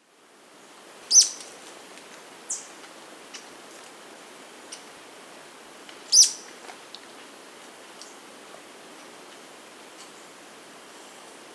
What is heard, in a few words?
Inca Flycatcher; Leptopogon Taczanow; S. America